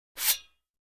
Fencing Sword Draw

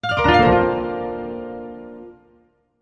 Music Effect Synthe, guitar

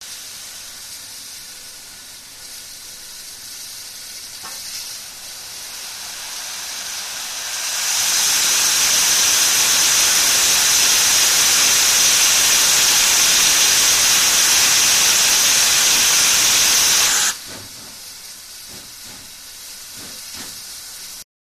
Challenger First, steam train

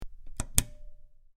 Button Press In Out, switch clicks

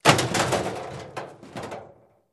Garbage Can Impact With Fall Or Movement; garbage cans

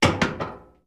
Switches, Industrial

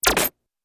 Synthy Beeplike Zap, zaps

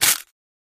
Plastic Crush Shrt

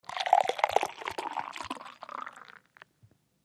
Beer Bottle Pour No Gl; eating & drinking

